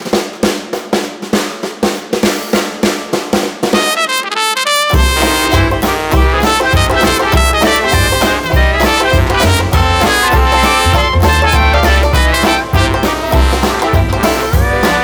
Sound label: dixieland 40s 100bpm